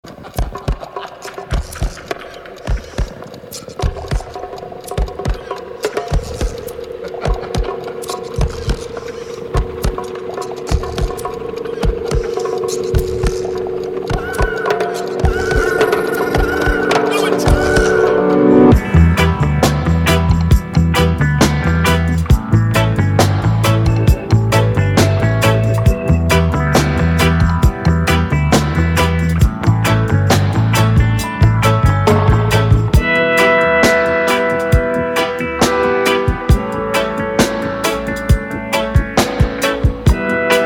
smoke groove, music

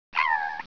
headcrab idle; gearbox

